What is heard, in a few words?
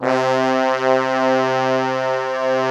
C2, Analog; USB Soundscan vol.49; Analog Fantasies; AKAI; PROPH